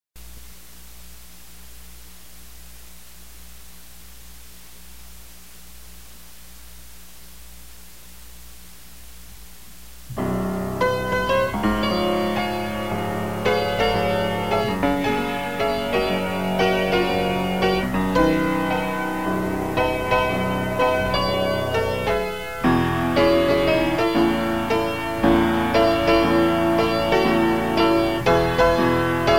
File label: PIANO